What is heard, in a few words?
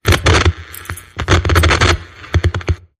Transistor Glitch